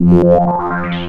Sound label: FILTERPOSN, TWAV BASS; Soundengine - CSR-000 The Art of Transwaves